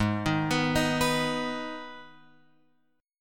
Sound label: Ab Minor Ab down Guitar Open F; Abm arpeggiated; JGuitar